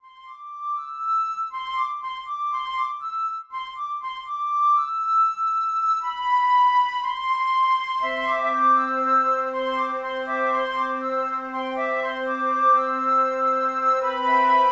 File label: flute orchestra